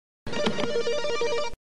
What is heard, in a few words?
buzz answer